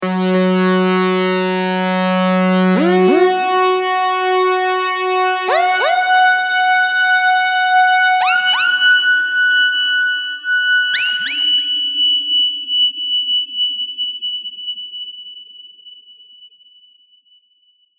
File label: lead